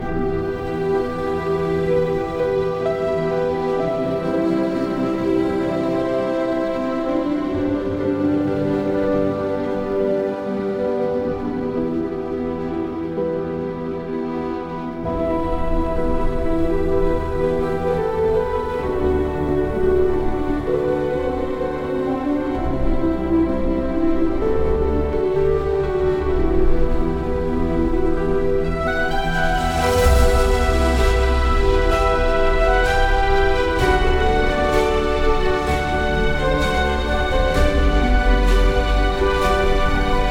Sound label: music